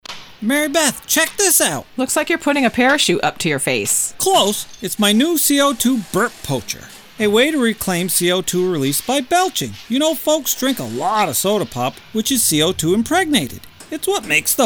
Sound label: Burp; Poacher